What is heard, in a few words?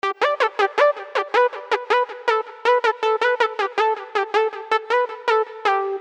melody